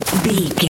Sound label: footstep snow walk